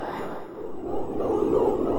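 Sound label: Music dpad Black Hole 120; From Dust; ps3; xbox 360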